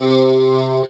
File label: TB SYNTH OO, Talk Box